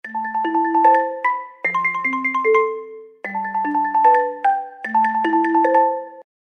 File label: Morning, alarms; KONKA; SP6